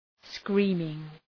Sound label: screaming